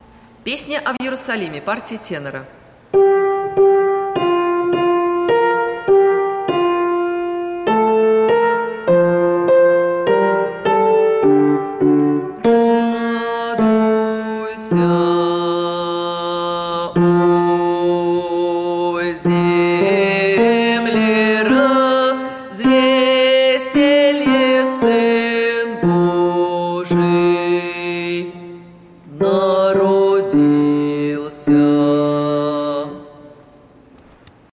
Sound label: tenor; A v yerusalime